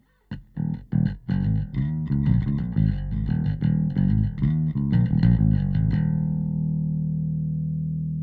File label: bass Rock Bass; bass preset